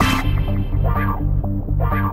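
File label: orchestra hit + synth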